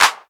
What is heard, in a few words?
clear clap sound c key, percussion single hits one shots